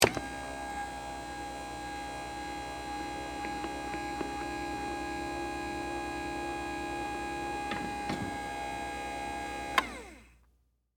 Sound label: Servo Motor, Hospital, Bed, Up, Feet; Industry, Servo, Motor, Hospital, Bed, Down, Feet; Motors; BlastWave